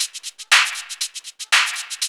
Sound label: LOOP; E-Lab; X Static Goldmine 2; akai